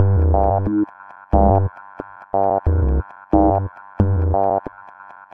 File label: Melted Plastic Bass Pulse; Extra Tweak Grooves